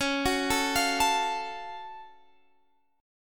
Gb Minor Major 7th Db

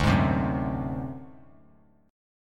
D 7th Flat 5th D down, chord; D7b5 strummed; JGuitar

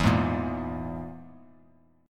E Major Flat 5th E, Bass Standard; strummed; JGuitar

